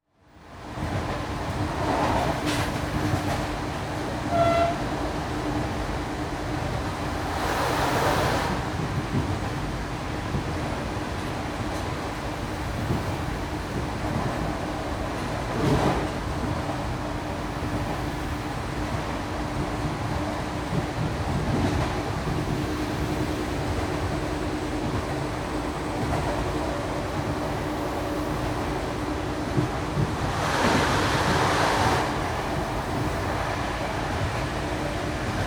Train interior; Travel & Transport

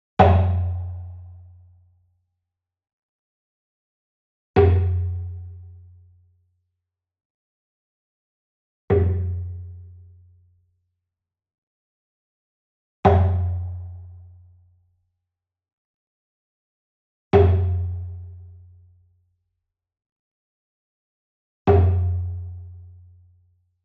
Wood Percussion Big Bom Hit Medium; boom library; Cinematic Strikes Construction Kit

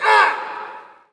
Hockey Male Hurt; SFX Kit; Hockey Vocal Sounds

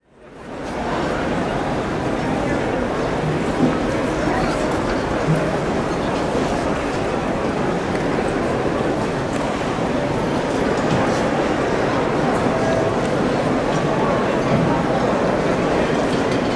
Convention Large Open Cafeteria, Walla; Sound Ideas; Walla Series

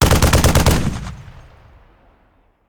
Machine Gun; Sound Ideas; Guns

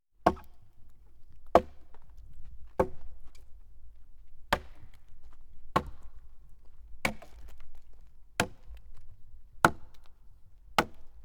Chopping With Axe; KMP Film Video Sound Effects

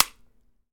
Break Egg Hit Concrete; SFX Kit; Foley - Misc

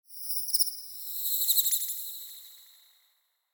Bird Very High Warble - Double Pass By; Hollywood Edge; sound designer's toolkit v2

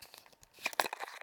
bottle open4, household; NS Studios - ultra sound collection

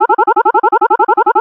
Arcade Movement, Video Game Sounds; 1001 Sound Effects

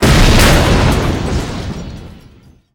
Explo Metal Cannon Destroyed; Destruction

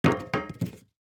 DICE on Metal, Throw and Roll, Foam, 2 Two Dice; Samples; ArticulatedSounds; DICE Sfx Party (Extended)

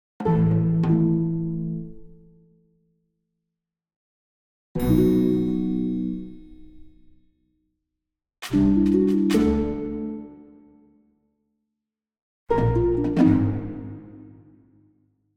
Orchestral Jingle Neutral; boom library; The Interface

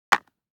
DICE on Cardboard, Drop, Standard, 4 Four Dice; Samples; ArticulatedSounds; DICE Sfx Party (Extended)

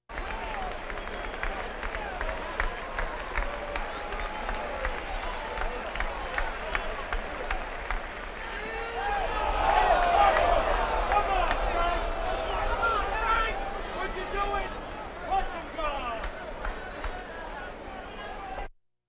Crowds Race cars Baseball

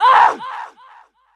Death; Velia; Kilta

